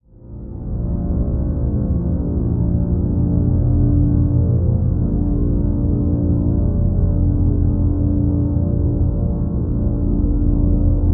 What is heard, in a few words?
Drone Musical Synth Tone Deep; Production Element Imaging; AdobeCC